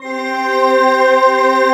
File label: D50 FIFTH PAD; East West; Global Sounds